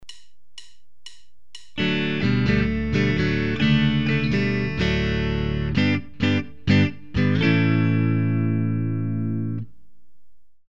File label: Typical phrase in open-D tuning, guitar